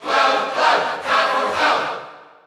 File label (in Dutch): Cheer Dutch; Cloud; SSBU